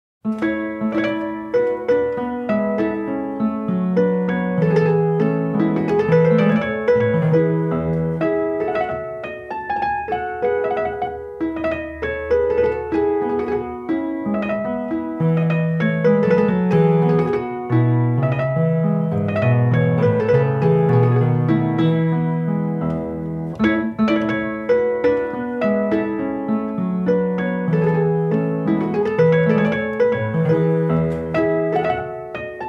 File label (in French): Rameau suites en la Fanfarinette